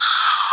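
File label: squeak hunt, zombie rat; hunger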